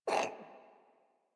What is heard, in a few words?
Kitten Cough Hairball; Enemies; Warframe; Catbrow